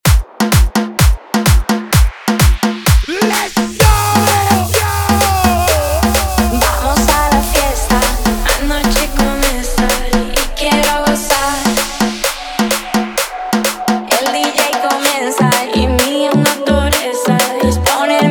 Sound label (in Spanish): intro voz 128bpm